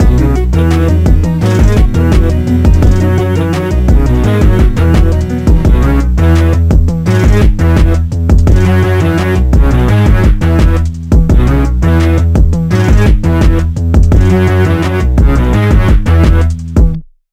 retro seq test

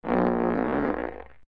fart burp tuned

